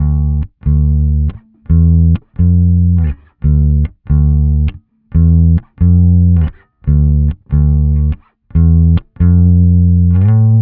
octavius squeezer fuzz morph to vco, bass

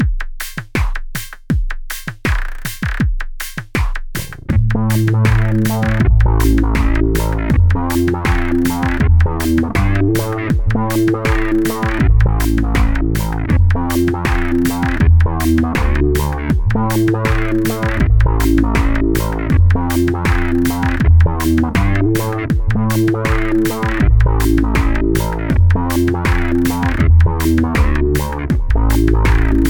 sequencer, bass

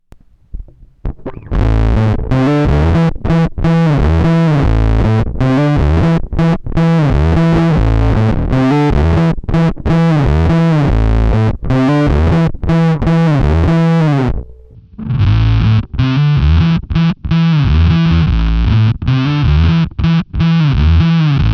octavius squeezer fuzz, bass

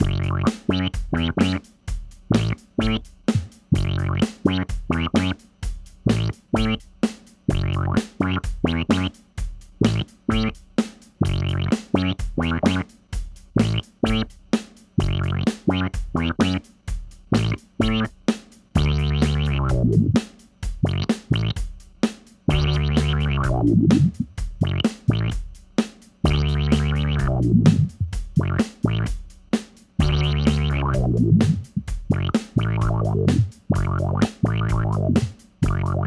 underwater lfo sync, bass

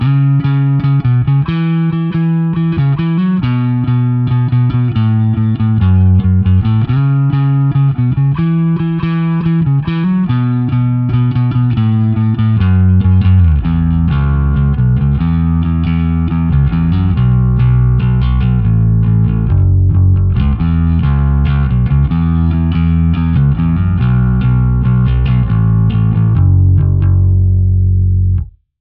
BOSS GT-10B basic amp sim, bass; Rock bass amp, dark sounding without a lot of brightness; Lakland Duck Dunn P bass picked; GT-10B USB; My basic amp sim

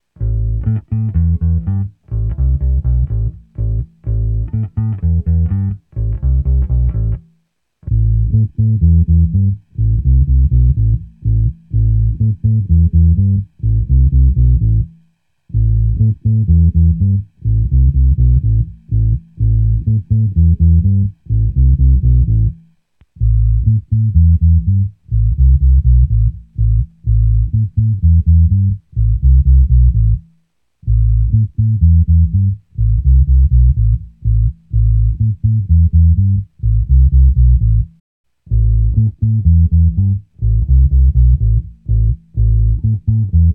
sonuus wahoo static lpf, bass

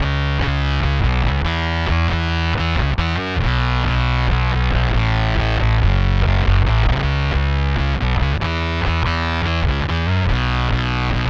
BOSS GT-10B vco fuzz, bass; Lakland Duck Dunn P bass picked; GT-10B USB; Gives what I think is a VCO type fuzz sound.

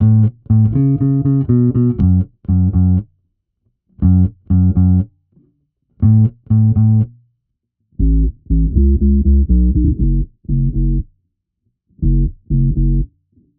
BOSS OC2 emulation; bass

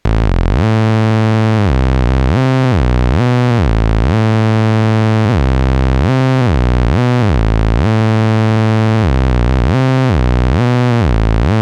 octavius squeezer saw, bass

